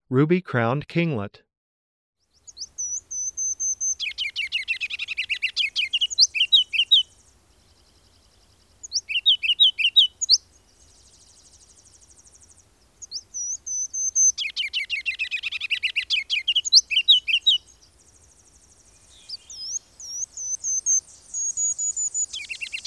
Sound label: ruby crowned kinglet